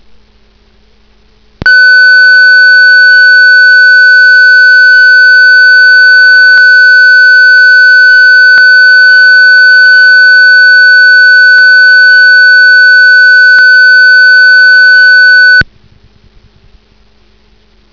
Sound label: Ros Test Tone 1500 Hz; TALLER PRÁCTICO DE ROS